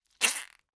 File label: cartoon squeeze squeak